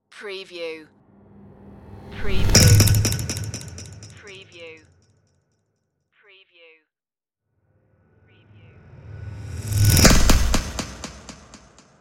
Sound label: delay logo impacts